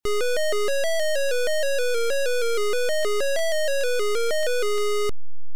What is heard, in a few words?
Title, Beeper; Alien 8